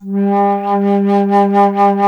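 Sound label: flute, alto flute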